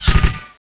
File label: hurt, paintball